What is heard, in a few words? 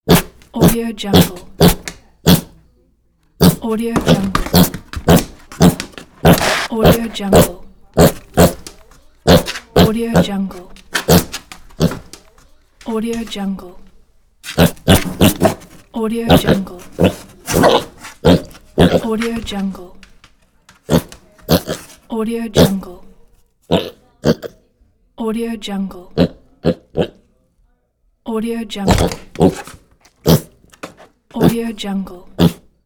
pig snort